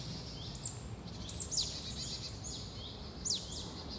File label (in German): sumpfmeise